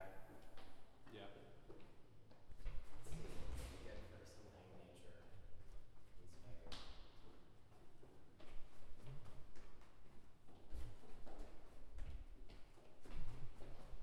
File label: staircase